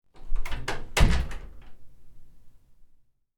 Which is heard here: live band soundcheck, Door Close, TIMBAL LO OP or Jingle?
Door Close